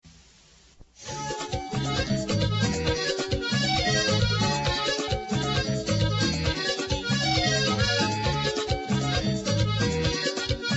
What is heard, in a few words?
merengue